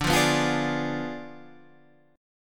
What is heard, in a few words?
D Diminished 7th D down Guitar Standard; Ddim7 strummed; JGuitar